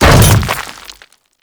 Hammer Boss Land Dirt, footsteps; Warframe; Grineer Hammer Boss

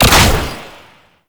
Ranged Weapon Fire; Warframe; Necramech; Weapons

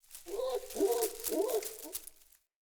Beaver Idle Chatter; Encounters; Animal Rescue

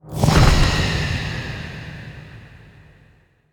Memory Go Board Lose; Warframe; Sacrifice

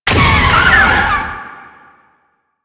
bike damage

